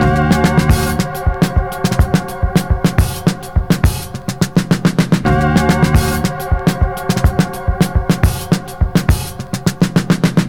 91 bpm drum loop d key, Free drum loop - kick tuned to the D note. Loudest frequency: 1434Hz